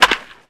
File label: Baseball Bat Crack